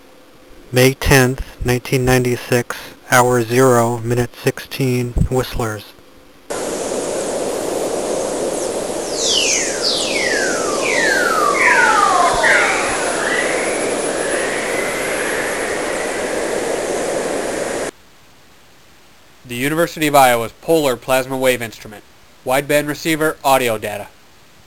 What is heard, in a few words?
whistle, plasma wave